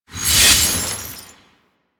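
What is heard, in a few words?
Explosion Cage Dissolve; Warframe; Warframes; Khora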